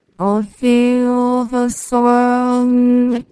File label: singing